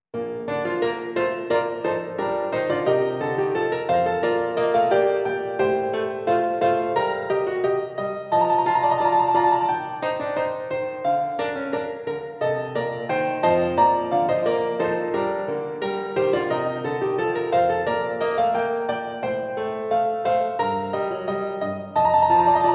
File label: Instrumental; Haydn